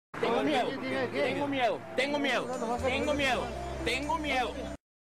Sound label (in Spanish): tengo miedo efecto de sonido